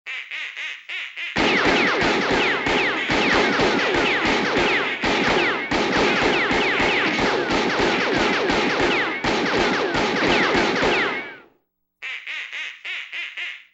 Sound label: duck hunting